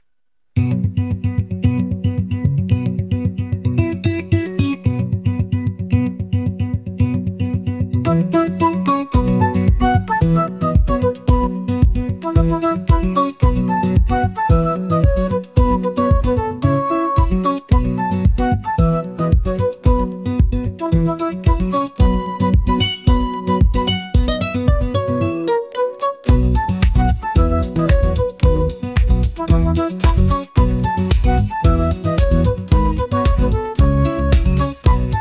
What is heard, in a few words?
morning bright